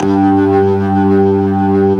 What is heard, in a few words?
HAMM G2, Keyboards Old School; USB Soundscan vol.09; AKAI; BIG HAMM